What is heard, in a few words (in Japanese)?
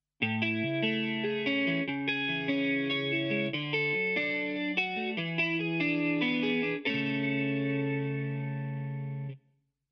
ダブラー Gｔ あり